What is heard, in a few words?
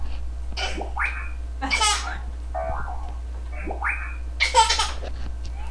Giggles